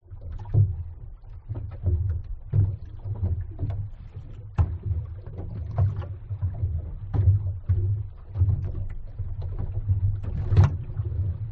transport boat floating engine off waves choppy inside hull cupboard fiberglass